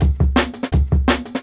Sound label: Jungle Breaks